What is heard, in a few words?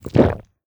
drink wine generous